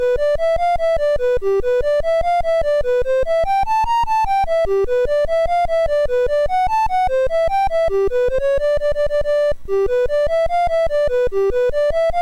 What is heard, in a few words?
sid MOS6581R3; Maze Graze Game BASIC